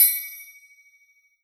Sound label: Korg N1R Open Triangle